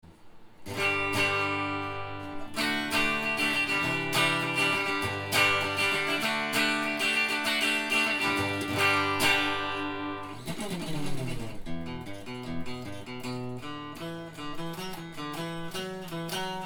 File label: guitar sound